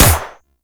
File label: Harpoon Gun Shot; Warframe; Sharkwing; Grineer Harpoon Gun